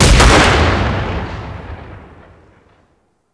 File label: Sniper Shot; Warframe; Grineer; Kahl